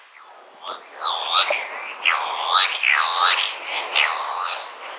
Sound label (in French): puffin de scopoli, orn; Ventre blanc Bec jaune; Chant gazouillis grogné; Cri : quasi-identique au chant